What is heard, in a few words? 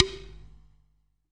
Small pot, Clay Pots, ETHNIC and WORLD PERCUSSION